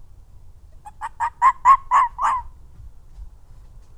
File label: red fox vulpes vulpes; lisy